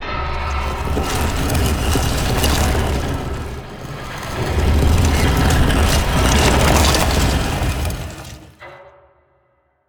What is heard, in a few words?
Ball Hall Ladder Reveal